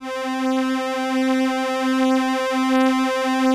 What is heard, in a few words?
LAMBDA, String Machines; Best Service; XX Large Most Wanted 2